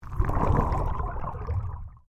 Underwater Movement Swoosh; Submerged impacts created by dropping a weighted rope into the ocean; Splash Sound Effects

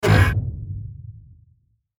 Stab Grind, Stab Dark Electrical Swell Hit; Stab Grind Decay; Stabs; Production Element Imaging Element Accent Transition; Blastwave

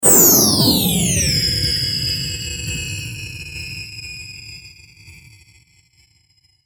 Power Down Digital Electrical; Rise And Dive; Blastwave

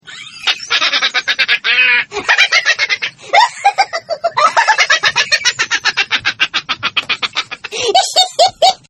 Laugh Evil Clown

